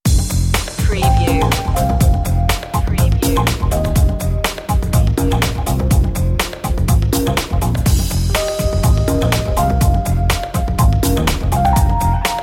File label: late brushes